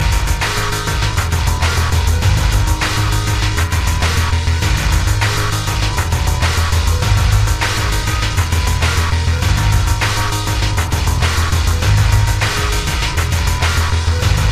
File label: Techno Cell